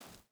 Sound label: footstep barefoot gravel jump start; Rust